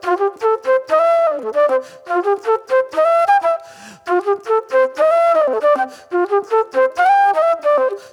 Sweet Soul Flute

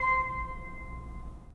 Pipe Mix Celeste, 3D Organs

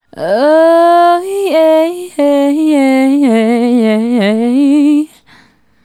Female Solo, Vocal

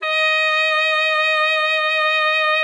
D#5, ALTOSAX; Xsample VOL-2; 90 s Sample CDs

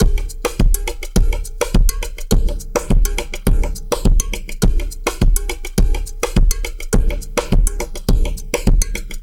SQUISH; Spectrasonics; Liquid Grooves 1 & 2; 104 COWSTIX

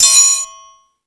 Crash 2, drum machine

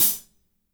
HAT, HI HATS; Spectrasonics; Burning Grooves

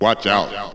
VOX SHORTS; Megabass Remix! 2

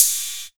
OH, 2 Step; USB Soundscan vol.51; House Side Of 2 Step; AKAI

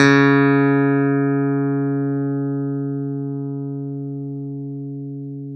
Clav DA C#4; Keyboards of The 60's and 70's

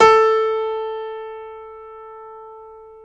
PNO, Grand Pno; Club-50 - Foundations Roland

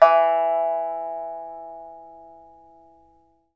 PIPA E4 MP; Spectrasonics; Heart of Asia

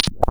Dull Copper Snap; Cwejman S1; DevSnd Cwejman S1 - JK

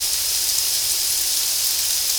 rain; Rain & Thunder; SFX

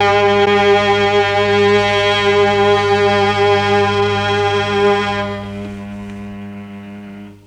MEL STRING; ILIO; Propeller Island Legendary M400

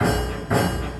Orchestral Loops; 128 STRAVNSKY

